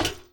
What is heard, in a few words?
metal step; Golden Crayon Sounds